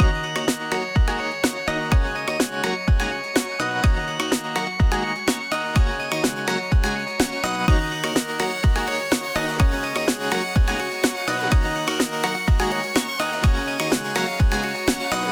Electronic music / chiptune, A lively upbeat chiptune